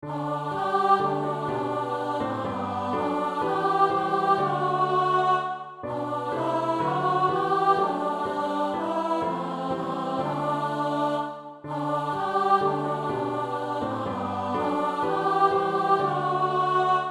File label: The Wexford Carol Alto